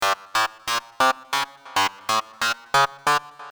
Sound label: Melodies Midi Psytrance